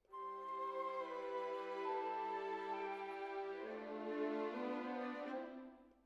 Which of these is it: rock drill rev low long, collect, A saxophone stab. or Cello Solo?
Cello Solo